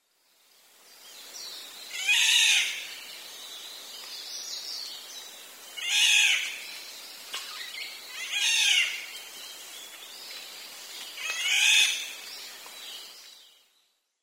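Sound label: black-naped oriole, sakata; koe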